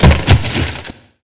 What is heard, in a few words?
wood crash; svencoop